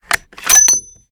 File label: reload empty eject; reloads; gmod